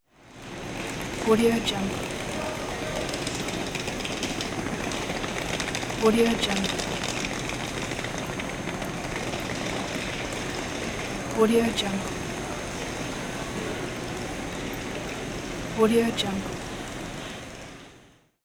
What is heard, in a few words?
luggage rolling in airport; urban sounds